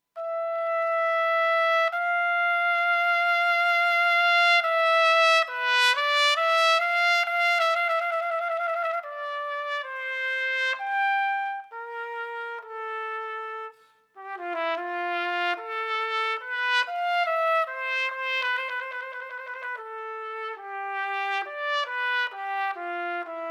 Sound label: Cello Suite, Sarabande; Trumpet; #1, BWV 1007